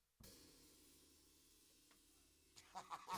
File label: SNR